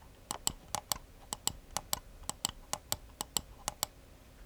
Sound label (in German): seitentasten